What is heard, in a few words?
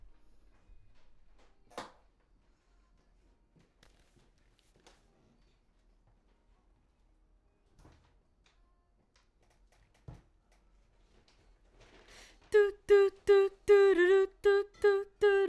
BG Vox